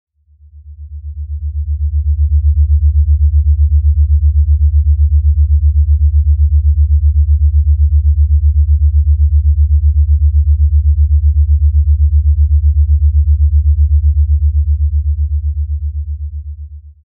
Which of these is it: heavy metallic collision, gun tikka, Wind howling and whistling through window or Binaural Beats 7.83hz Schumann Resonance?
Binaural Beats 7.83hz Schumann Resonance